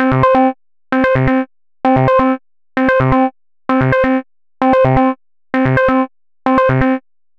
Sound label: 130 C synth, Synth Loops, Trance; Trance Explosion Vol1